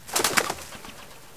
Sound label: digger